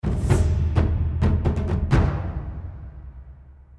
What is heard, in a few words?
drum; Griddlers Tale of Mysterious Creatures; Athene